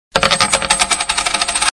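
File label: sms ringtone coins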